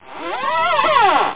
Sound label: book